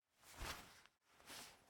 Throw Knife; game audio; Mita; MiSide

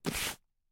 Cloth Drop; Game rip; MiSide

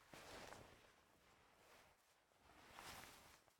Take Glasses; raw game audio files; Mita; MiSide

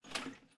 Scarf Open; Game rip; MiSide

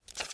Tape Take; Game rip; MiSide

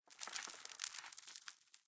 Debris Fall; Game rip; MiSide

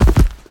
Step Enemy Boss; Game rip; MiSide

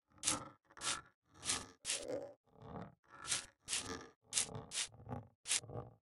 Itch; MiSide

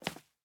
Step Heel Marble; Game rip; MiSide

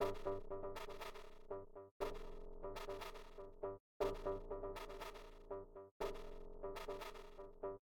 Music Defeat; Game rip; MiSide

